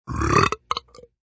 Burp Open, Burp, mouth open, (human voice); Burping; VoiceBox

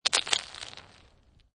Snow And Ice Bits Of Ice Falling On Ice

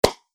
Single Dramatic Hit, impact

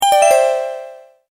multimedia tone alert

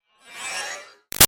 Reveal Digital Shimmer Metallic; Sound Design; Wipes; BigRoomSound

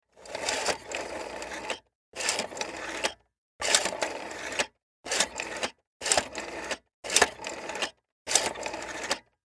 Technology Telephone Rotary Dial Antique; Telephone Sound Effects; Telephones (Sound Effects:Electronics); SFX Bible 6

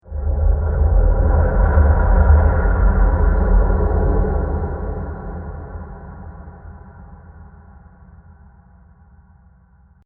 Spacy And Diffuse, Scary Soundscape; Horror; Crafted Signals

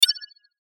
Chime Notification Synth Stab Warble; Production Element Imaging Element Accent Transition; Chimes; Multimedia; Blastwave

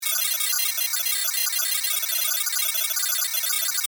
Data Readout, Modulated, Scifi; Tech

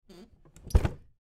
Dresser Drawer, Dresser Drawer Squeak Close; Household; Blastwave

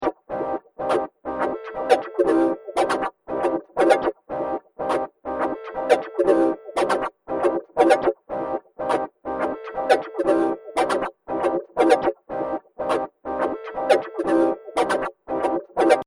120 Bpm Droid Failure Boogie, Robot; Robot Sound Effects; Looping Sound Effect; Fantasy, Horror And Sci-Fi Games

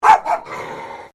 Animal Dog Chihuahua Bark Double Snarl; Newcastle; Various domestic dogs; Dog Sound Effects; Dogs (Sound Effects:Animals); Foundation1

